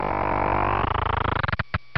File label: Open Coffin